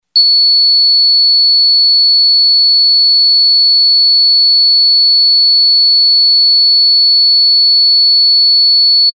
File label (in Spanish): tono